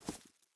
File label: footstep rubber boots grass jump start; Rust